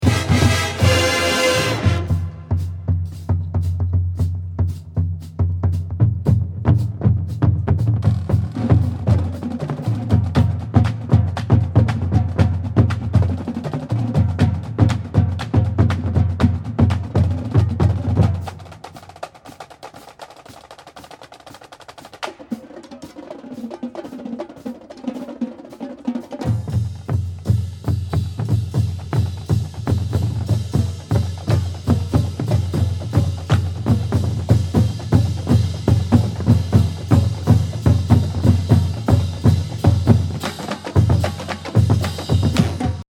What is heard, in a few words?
Drum Feature, marching band